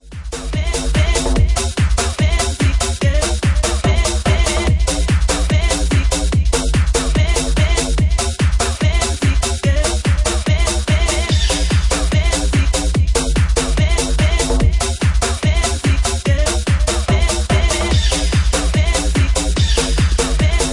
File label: Bouncy Mix